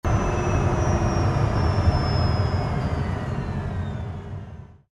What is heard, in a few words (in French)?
ebike ralenti